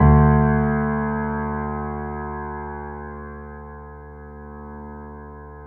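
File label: D2, grand piano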